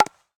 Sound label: Baseball Bat Bunt